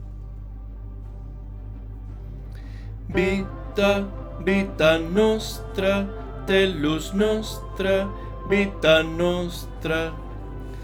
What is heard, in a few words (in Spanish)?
Bajos A y B; bandas sonoras